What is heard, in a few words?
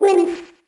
Wins